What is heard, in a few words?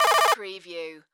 Computer Readout